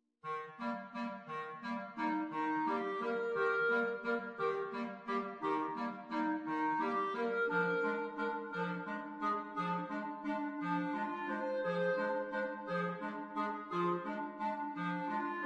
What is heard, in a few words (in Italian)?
quartetti